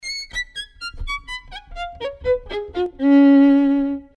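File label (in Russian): скрипка эффект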